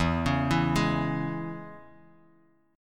E 6th, down, Guitar, DADGAD

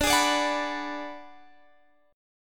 G sharp Minor 7th Flat 5th D

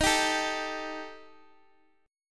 Eb Major Flat 5th, Guitar Open D

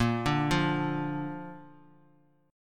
Bb Major Bb down; arpeggiated; JGuitar

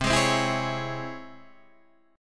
Db Minor Major 7th C

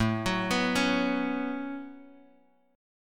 A 6th Add 9th A down Bass Standard

